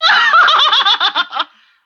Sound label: vox laugh small